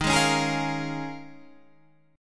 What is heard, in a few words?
D sharp 9th Suspended 4th D sharp, Guitar Half Step Down